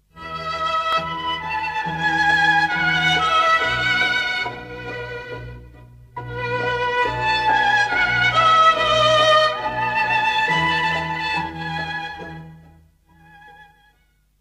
Viool

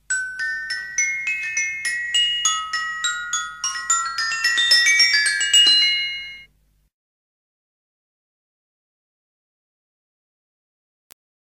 Klokkenspel

